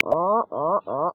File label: Puffin, Polar Region; Xiaomi Redmi-Note-8-Pro